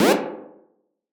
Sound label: Jump; GlobalGameJam 2021